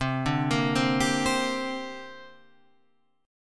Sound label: C 7th Suspended 2nd C; C7sus2 Chord {8 5 8 5 8 8} arpeggiated